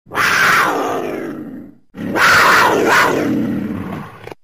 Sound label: Mountain Lion Sounds Car Horn